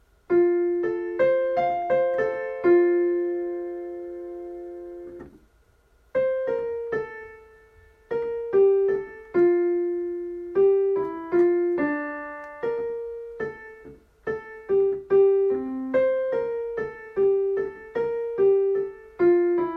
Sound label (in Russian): Диктант фа мажор